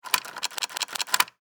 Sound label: Steampunk Gear Wind, Scifi, Steampunk, Gear, Wind, Device, Clicks, Crank; Science, Fiction; Steampunk Sound Effects